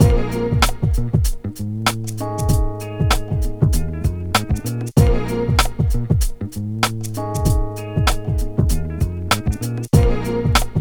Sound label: beats